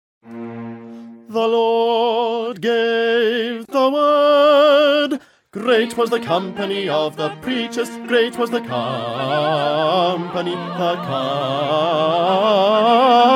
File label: bass, messiah